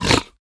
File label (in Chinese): 猪灵受伤声